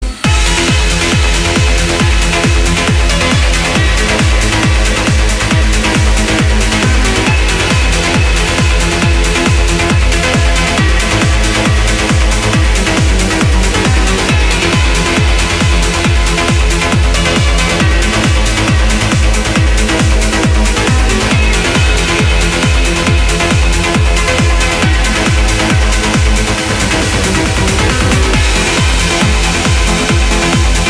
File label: Trance; Trance Energy Australia 2009